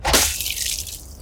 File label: swing hit flesh, weapons; darksouls